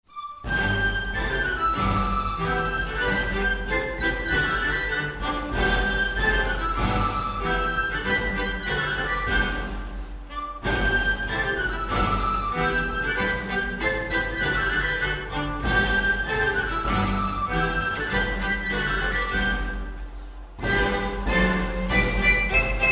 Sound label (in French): Tambourin